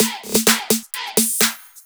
Fills 128BPM, Clubsounds

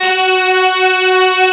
FL STRINGS F4

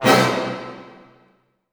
F# MAJ FF, orchestra hits; Sound On Sound - Peter Siedleczek's Orchestra

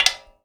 Finger Knicks, Prepared Kit; Battery 3 Sample Kits

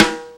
Snare, Percussion Hits, Snares

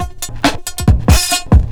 LOOP FX, Big Beat Drumloops

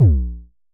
Kicks A#1; Vermona Kick Lancet; LookIMadeAThing Kicks; Festival Kicks; Drums

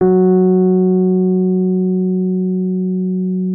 F#3, RHODES; Session Instruments; Best Service ProSamples vol.42

